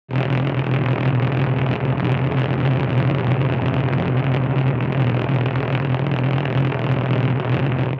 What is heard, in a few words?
tank